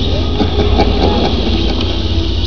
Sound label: curly laff